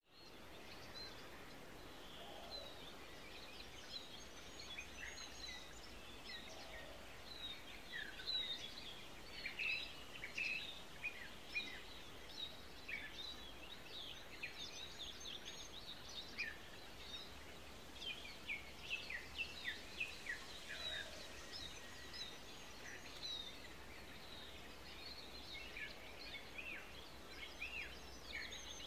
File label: Serene Mountain Ambience